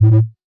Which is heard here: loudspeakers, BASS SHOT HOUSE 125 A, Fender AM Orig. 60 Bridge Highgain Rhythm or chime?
BASS SHOT HOUSE 125 A